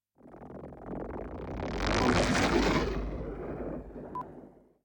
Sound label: Spaceship Whoosh